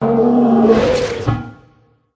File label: death; zarlog shaman